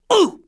HURT; TrueCrimeStreetsOfLA; XBOX

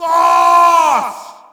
Shout, audience; UFC Tapout 2

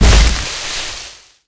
hit hay; hits; Tony Hawk's Project 8; XBOX

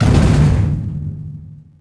metal hit; Star Wars Obi-Wan; XBOX

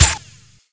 Cancel Back

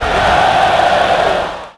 derision, Crowd; David Beckham Soccer; XBOX

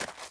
footsteps gravel

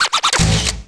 goal good; Tony Hawk's Project 8; XBOX

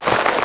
leather jacket running right; characters

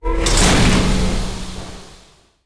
droid tray open; droids robots

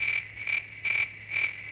night loop; Cars; XBOX; Ambient

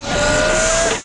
Mech Power Up; Tallan Mech; Aliens

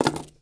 blaster drop wood; objects; Star Wars Obi-Wan; XBOX

